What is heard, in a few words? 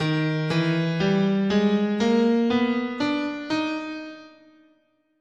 Eb Double Harmonic Major, scale